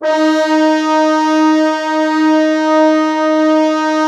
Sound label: Orchestral Brass